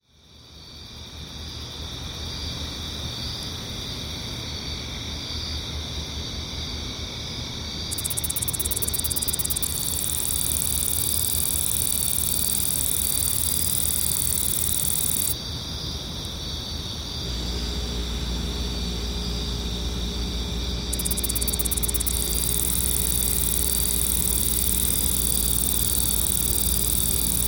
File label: snake drive